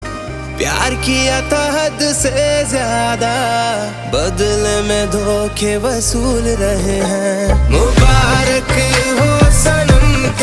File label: Ringtone